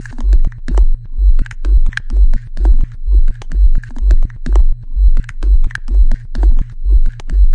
techno kicks clicks, drum loops and breaks